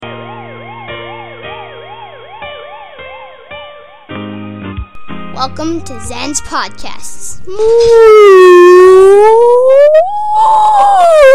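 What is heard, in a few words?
whale beep whistle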